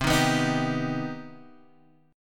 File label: C Minor Major 7th C Guitar Standard; strummed; JGuitar